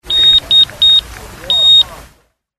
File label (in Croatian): zvonce